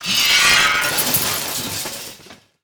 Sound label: Mechanical Whelp Death